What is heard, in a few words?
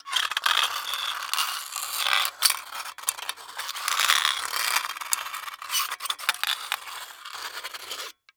Impact Metal Dragging